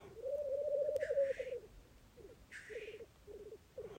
Black grouse - rolling and hissing sounds

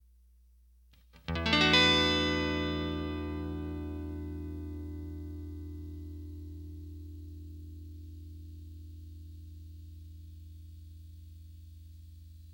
Clean E9 Guitar Chord; Guitarz1970

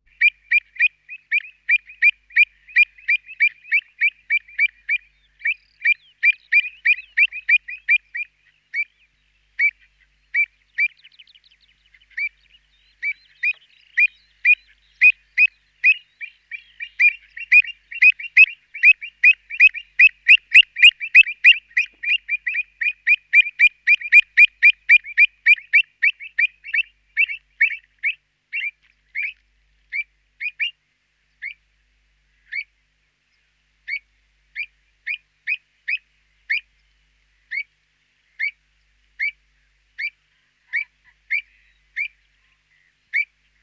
Avocet (Recurvirostra avosetta)

